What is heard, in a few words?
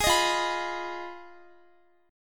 F Minor 7th Flat 5th, Guitar; Fm7b5; strummed